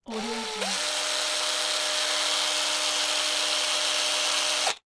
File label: electric screwdriver